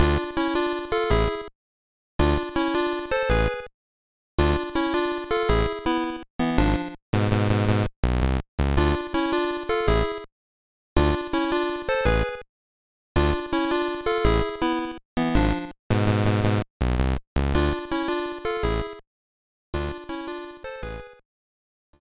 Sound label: BGM